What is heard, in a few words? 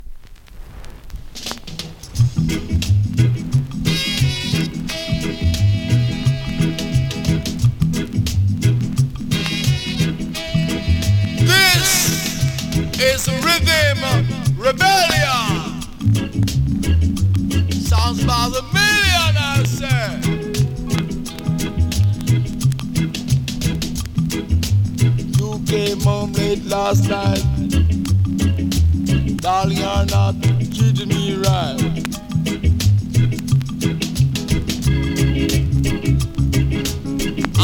RYTHM